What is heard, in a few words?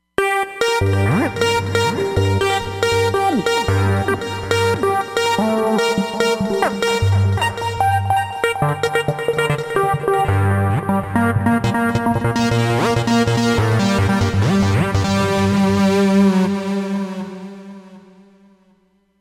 Dirty Voltage